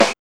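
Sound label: snare drum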